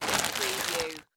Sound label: Plastic Bag Pick Up